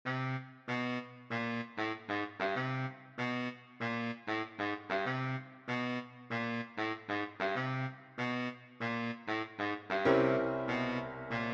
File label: Baritone Saxophone